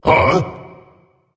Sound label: surprise; cerberus